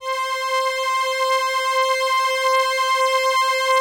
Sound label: LAMBDA, String Machines